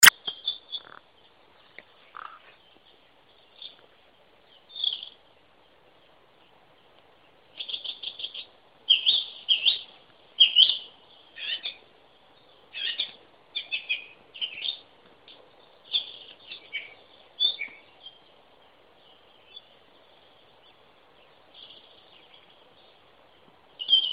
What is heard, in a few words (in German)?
vogelstimmen